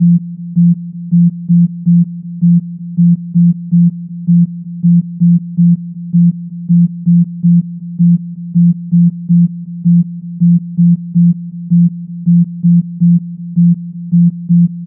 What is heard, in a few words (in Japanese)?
ASK, 振幅で 0 と 1 をあらわすので、音の“強弱”として聞こえます, キャリア周波数: 172Hz